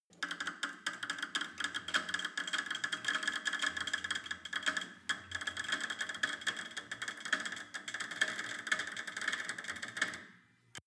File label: cable machine creak up